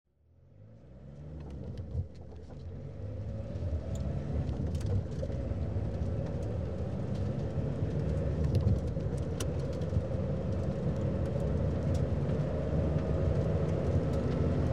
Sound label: int constant run, skids to a stop, Cars; BBC Sound Effects Library